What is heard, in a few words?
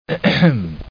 ahem